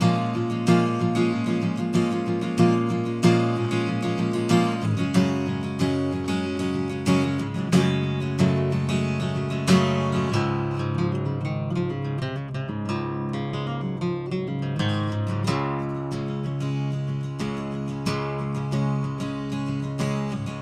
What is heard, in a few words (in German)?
gitarre dry; townsend labs sphere l22 test